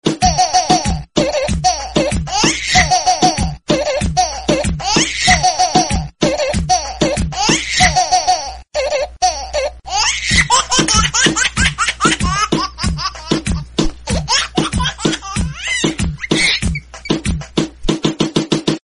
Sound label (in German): lachende baby remix ringtone